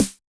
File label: SD, Yamaha DJX 2B